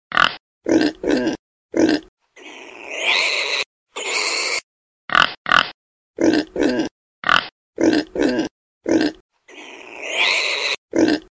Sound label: pig sounds